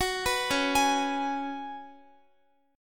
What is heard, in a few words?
Db 7th Suspended 4th Db down Guitar; arpeggiated